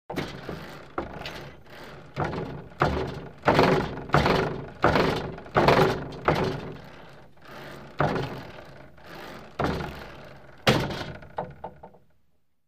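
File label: Gym Weight Equipment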